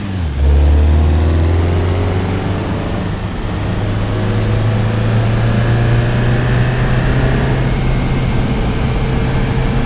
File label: third, vehicles